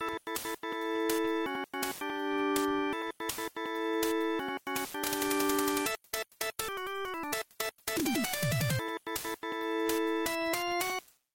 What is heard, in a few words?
Power-Up; Genesis; game soundtrack; Magical Hat no Buttobi Turbo! Daibouken